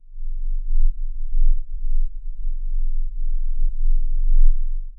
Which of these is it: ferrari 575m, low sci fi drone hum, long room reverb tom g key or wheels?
low sci fi drone hum